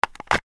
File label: get walky talky; firefight